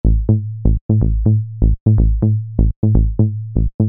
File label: Bass